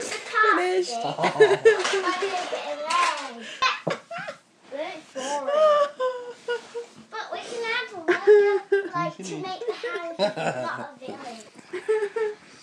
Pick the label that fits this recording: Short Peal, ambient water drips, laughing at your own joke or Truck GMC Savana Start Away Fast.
laughing at your own joke